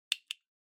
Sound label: Stopwatch Mechanical Start Hand